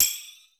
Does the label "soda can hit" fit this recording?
No